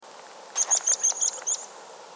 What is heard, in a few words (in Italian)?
Pispola